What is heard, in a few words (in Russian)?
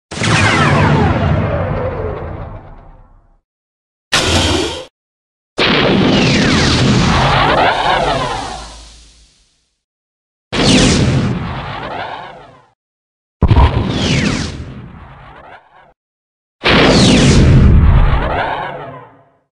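protonnye torpedy, proton torpedoes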